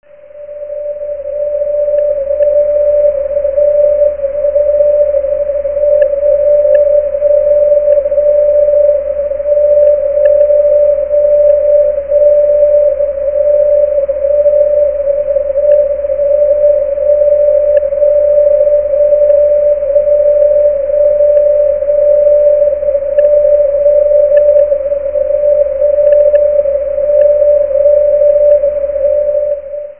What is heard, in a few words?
WWVB